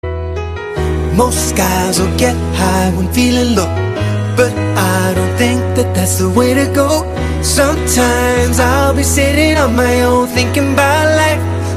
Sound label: hollywood ringtone